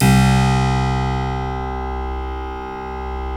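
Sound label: C1 clavinet left, Keyboards Acoustic; USB Soundscan vol.17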